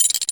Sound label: metal